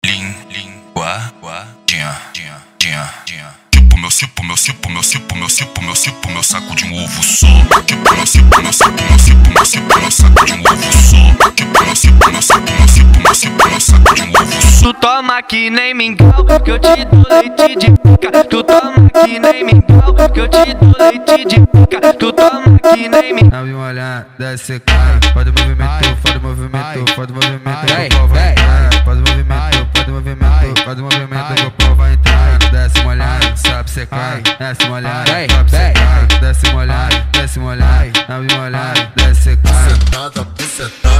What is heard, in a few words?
funk remix